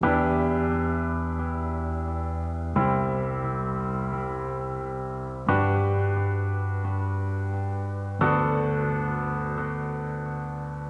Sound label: Taped 88BPM E min, Instrument Loops, Pianos; 99Sounds; Monosounds; Music Loops